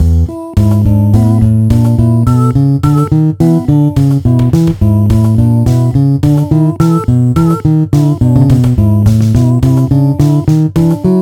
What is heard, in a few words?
BIGBAND